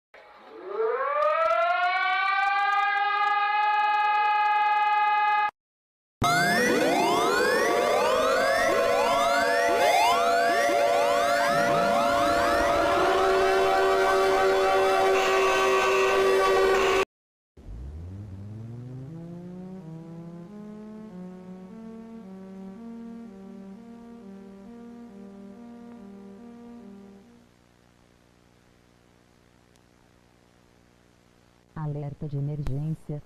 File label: brazil's eas alarms